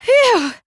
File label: "phew"; komaru